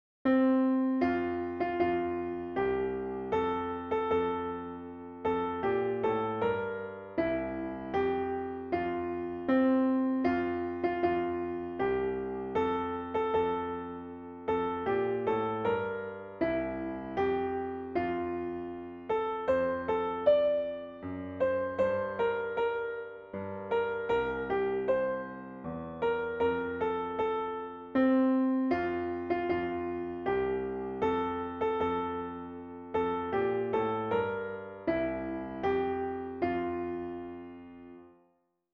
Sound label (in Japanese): ピアノ